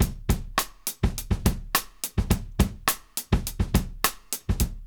LOOP 104; AMG Gota Yashiki Groove Activator; JR.LOOPS 104

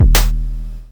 Electron Sidstation, Drum Machines

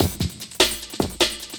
LOOP, 150 BPM, Drum & Bass; Ueberschall; Drum & Bass Resonance

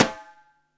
BRUSH RIM; THE JAZZ

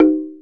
Tongue Hi; Planet Earth; Digital Sound Factory Planet Earth

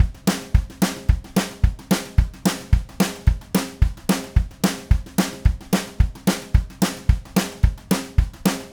Red Maple Groove 110, DW Red Maple Kit

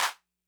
Clap Accent, 808; classic machines

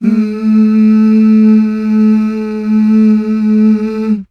MMHH

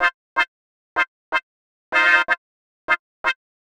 125 Raw stab F, Keys, Nu Jazz House; PowerFX - Nu Jazz House

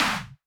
snare, Drum Machines, Clavia Nord-Drum; 300 Drum Machines

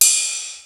drum; Rubber Chicken Software Sample Bank; DRUMS + PERC; EMU

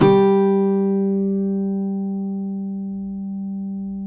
cuatro; Spectrasonics; Hans Zimmer Guitars VOL-2

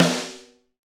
SD Real, Roland; Sound & Vision - Gigapack I

